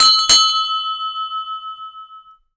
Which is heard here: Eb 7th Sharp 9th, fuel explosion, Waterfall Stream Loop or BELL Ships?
BELL Ships